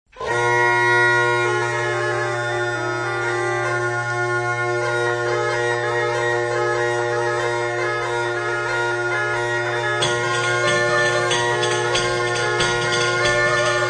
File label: chevret, instruments medievaux